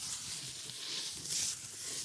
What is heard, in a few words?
Chalk Move; Game rip; MiSide